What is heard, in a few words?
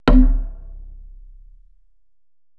prc c#6, Procussion Mix; Retro Sampler; EMU E-III